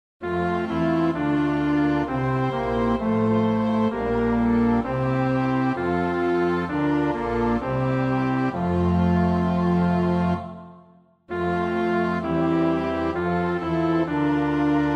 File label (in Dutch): bas, koorpartij oefening; Bach (Johann Sebastiaan); BWV 052